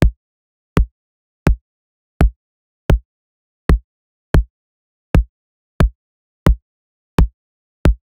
kick football slowly

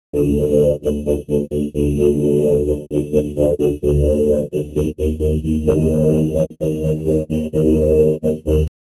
good vibe, house music; alien lifeforms

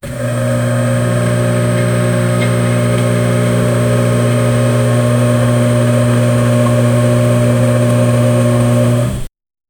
dough making funny boing

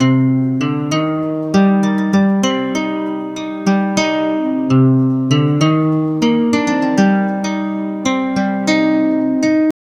98 bpm dark sad, indian

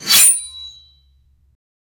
a massive rusted metal axe

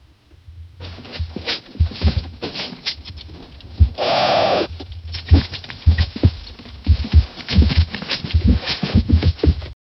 heavy elephant footsteps, jungle

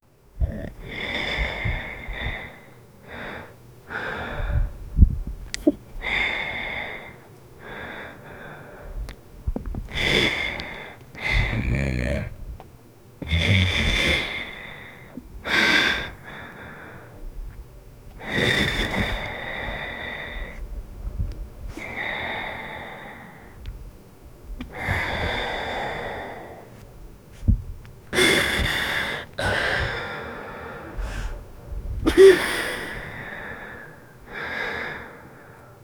years old man holding, mouth closed

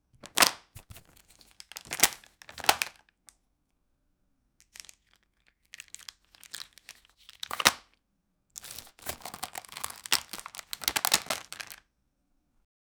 opening small plastic cos, cosmetics

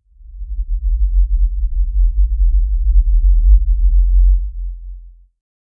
deep cosmic ambience

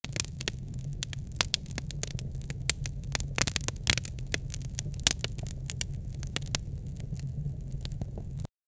crackling fire with bubbl, cooking

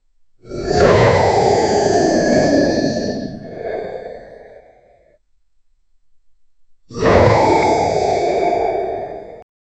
charizard pokemon dragon

